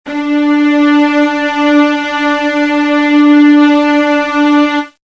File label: violin string 3 D4